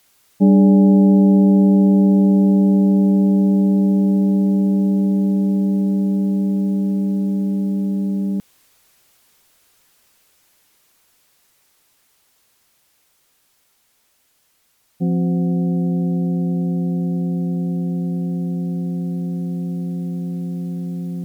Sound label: temple bell; wat buddhaguna